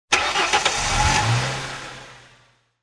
Mustang V6 Start Up Engine Perspective; Cars; Sound Effects:Vehicles

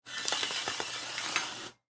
Shower Curtain Open; Household; Bathroom

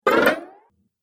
Cartoon Game Fast Vibrating Jump; Bounce Sound Effects

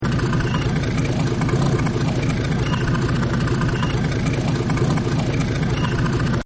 Big Boat Idle; Boats; Vehicles

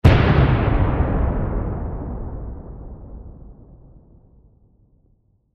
Explosion Blast Black Powder Large; Military; Warfare; Blastwave

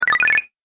Game App Power Up; Video Game Sound Effects

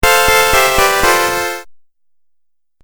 Game Music Phrase I, video game

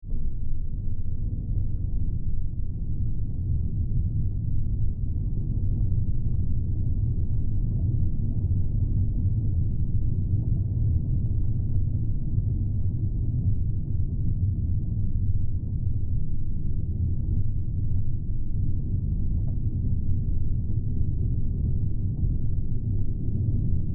LFE Rumble Active Ominous; Miscellaneous Impact; BigRoomSound

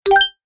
Gameplay Bonus, video game

